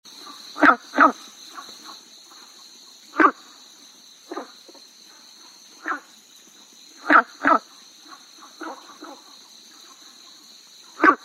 gunthers brown frog

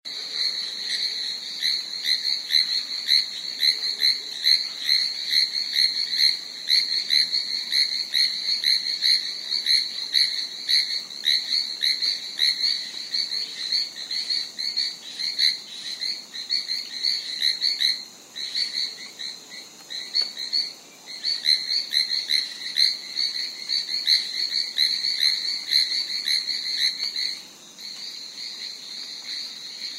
chinese tree frog

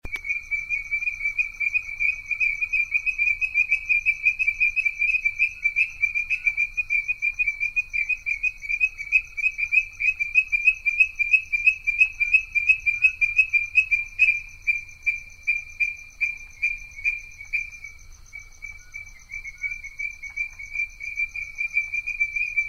meintein tree frog

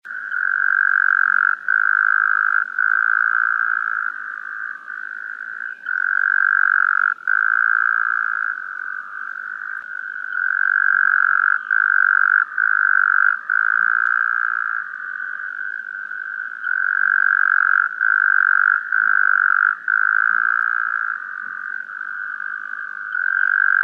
taipei tree frog

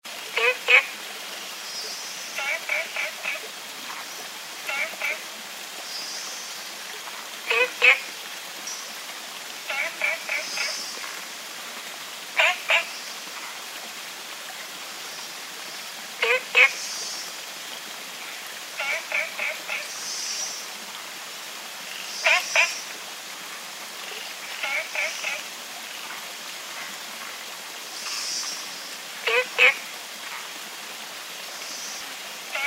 olive frog

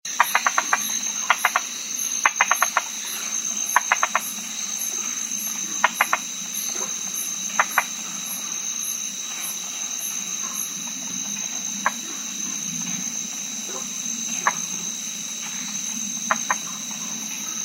white lipped tree frog

